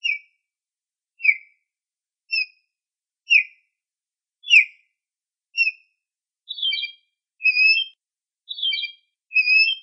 Osprey Call

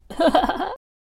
Giggle

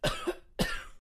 Man Coughing

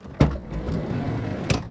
van sliding door; daniel simon

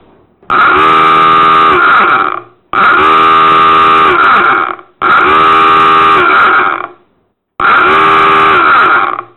submarine diving alarm; daniel simon

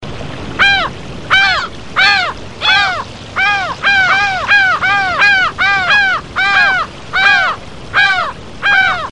Pair Of Laughing Gulls

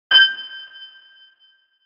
Hitting Metal; Douglas Vicente

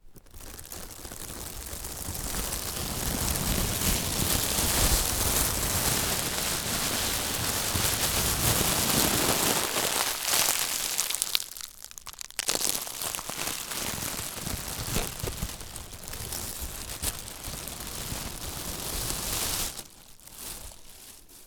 plastic; mike koenig

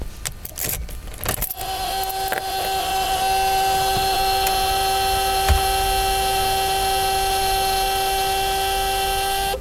Old Electrical Buzz Alarm

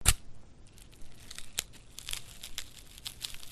Stab And Tear Flesh

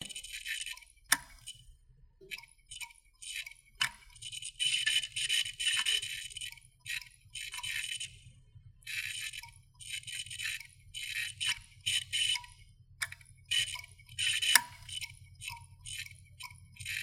Hard drive clicking; Robertv

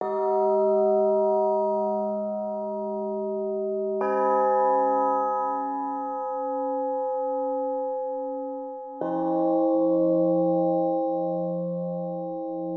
bells tibetan; daniel simon

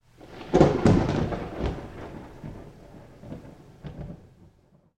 Thunder

